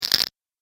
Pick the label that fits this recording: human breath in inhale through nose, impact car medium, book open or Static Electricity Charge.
Static Electricity Charge